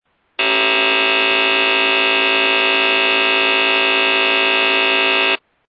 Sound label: Attention Extremely Rapid Siren Multiple Frequency